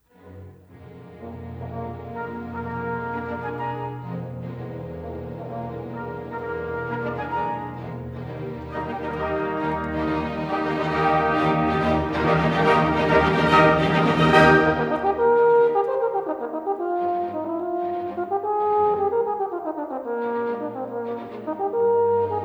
Horn Concerto; R Strauss

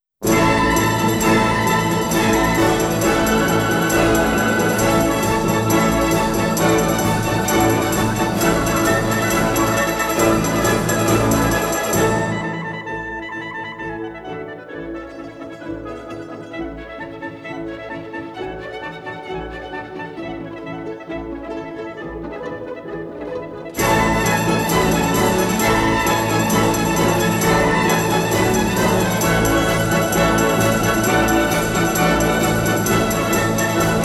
Capriccio Espagnol; R Korsakov; Markevitch

